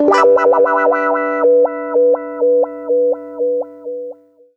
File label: 120 WAH PAD, Electric & Acoustic Guitar Loops; USB Soundscan vol.04; AKAI